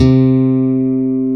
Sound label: BASSES, Roland